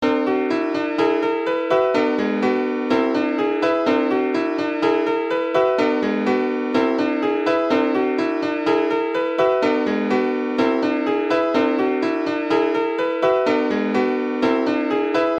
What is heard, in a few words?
15tet warped; djtrancendance